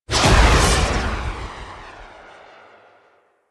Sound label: UI Defense Reward Leave; Warframe